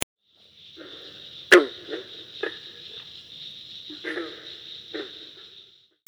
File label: green frog